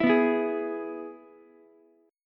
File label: E-Major E, Ukulele; strummed; JGuitar